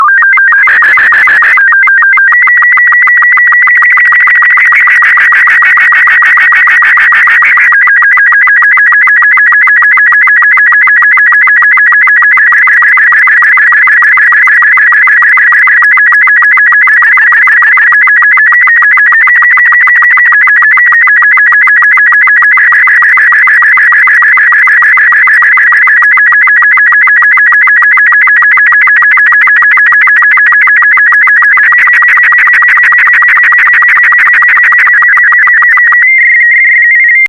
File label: sstv; R36; VK4MSL